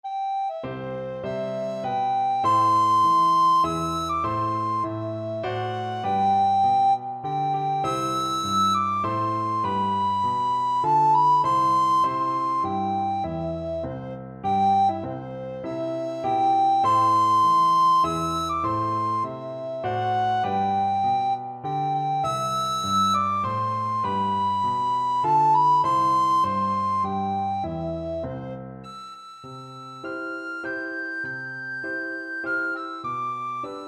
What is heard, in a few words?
star spangled banner recorder